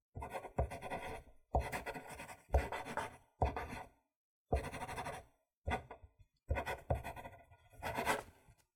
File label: writing with a pen